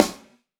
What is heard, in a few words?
Orc Snare